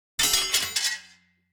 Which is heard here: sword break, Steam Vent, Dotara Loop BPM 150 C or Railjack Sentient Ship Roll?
sword break